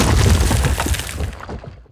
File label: Props Road Destroyed